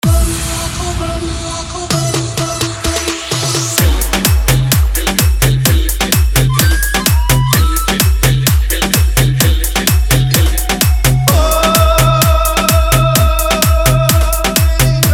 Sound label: Edm Bass Remix